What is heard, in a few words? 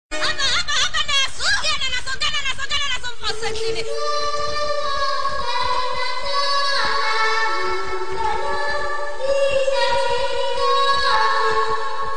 Crazy Junglee Hootin